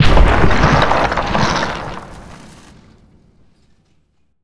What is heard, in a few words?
rock falling; Enviroment